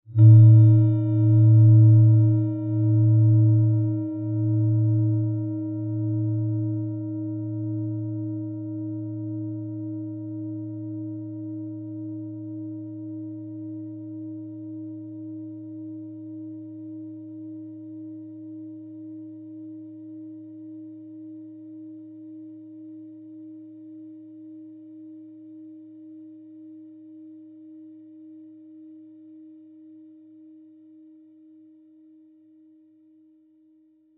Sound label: singing bowl all; sum of peaks + background; misy dźwiękowe